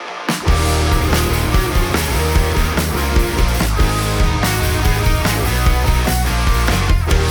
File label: rock orig